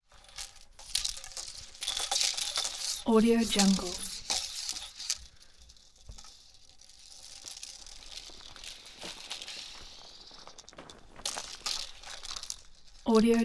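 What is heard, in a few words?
exterior hula hoops hulaing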